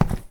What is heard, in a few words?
Tile long